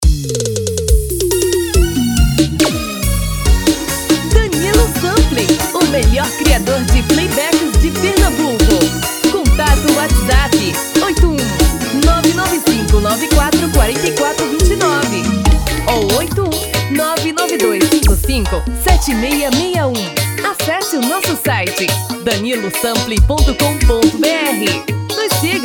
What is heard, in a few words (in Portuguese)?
Piseiro